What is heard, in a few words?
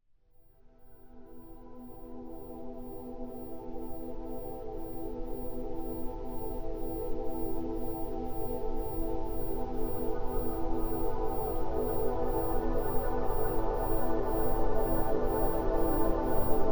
super deep meditation binaural